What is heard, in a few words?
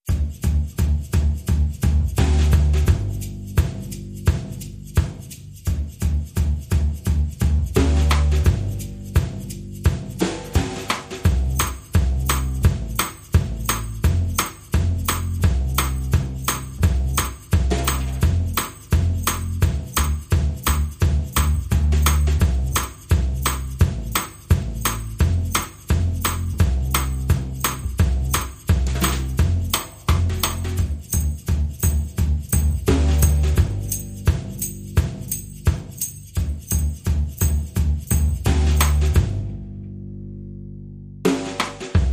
Drums Bass Percussion